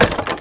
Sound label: Wood Shield Drop; Civ4 warlords